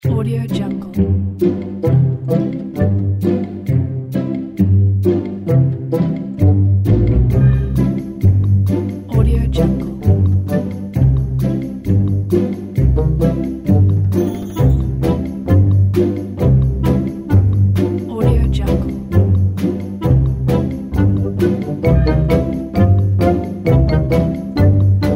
funny cartoon pizzicato

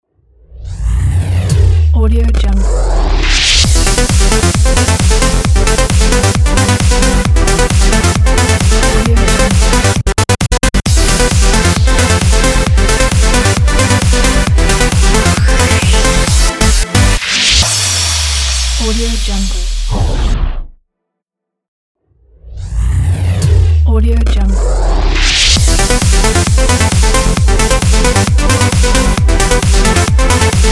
trance radio logo

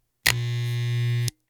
electric shaver short on off switch click

